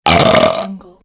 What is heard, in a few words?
loud burp